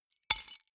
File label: key ring large drop stone